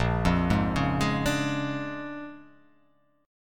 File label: A sharp 7th Sharp 9th